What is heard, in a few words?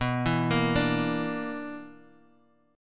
B-Minor Major 7th Double Flat 5th B down Guitar Half Step Down